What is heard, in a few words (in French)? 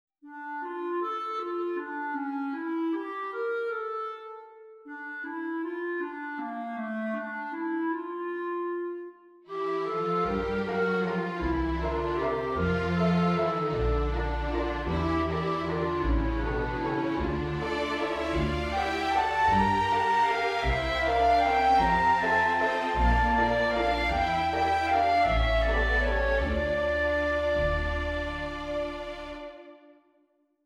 Clarinette